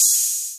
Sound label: Hats Electro Open